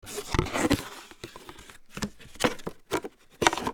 Cardboard Box

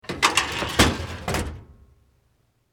File Cabinet Drawer

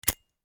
Lighter

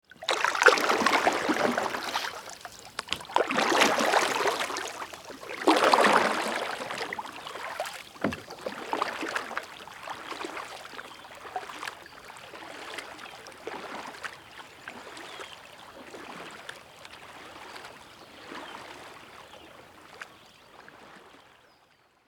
Kayak

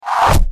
Arrow Body Impact

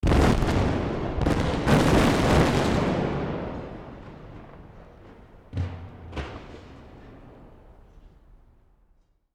Explosion

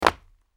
Footsteps Hard Concrete Jump

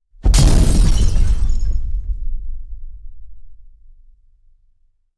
Explosion

